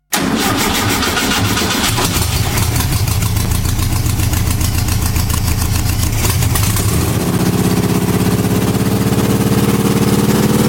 Auto VW Kaefer; Soundbeispiele

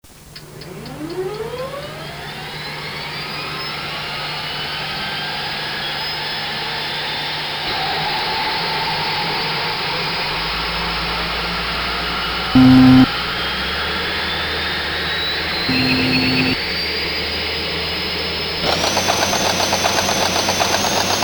Panzer M1; Soundbeispiele

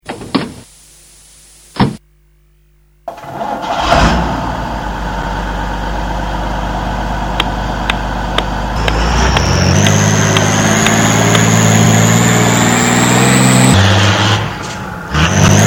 Truck Unimog 406; Soundbeispiele

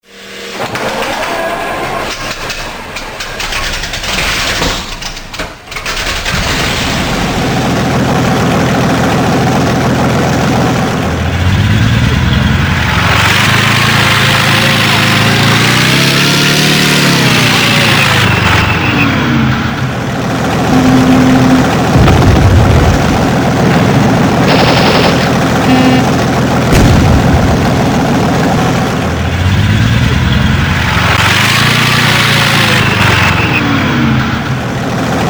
Panzer III; Soundbeispiele

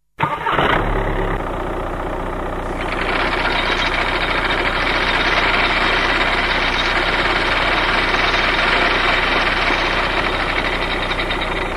Schiff Schlepper; Soundbeispiele

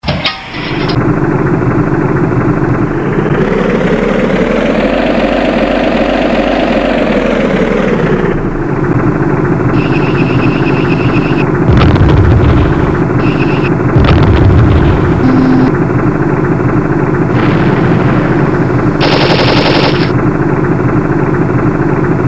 Panzer Leopard 2, modellbau; Soundbeispiele

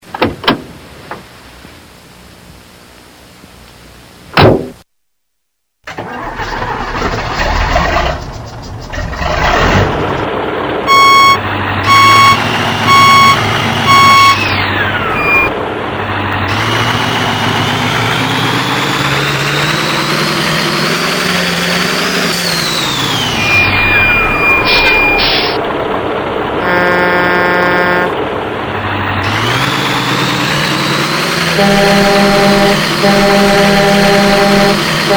Truck Scania V8 mit Turbopfeifen; Soundbeispiele

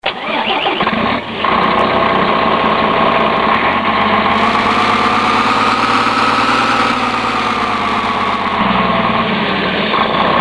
Pistenraupe, modellbau; Soundbeispiele

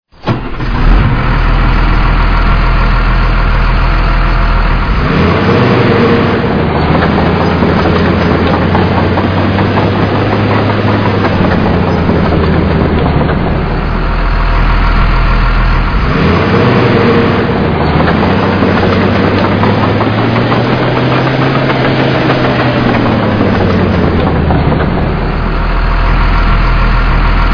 Laderaupe Caterpillar; Soundbeispiele

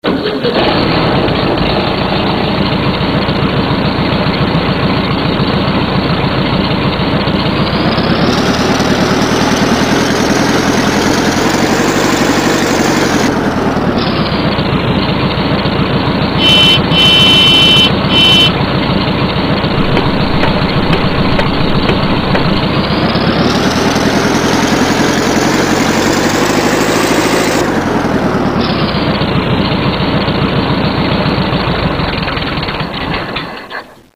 Auto VW Bus, modellbau; Soundbeispiele

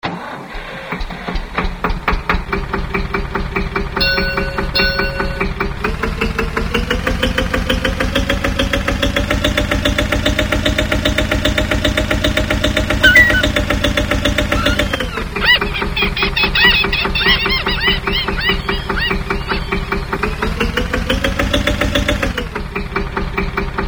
Schiff Kutter, modellbau; Soundbeispiele

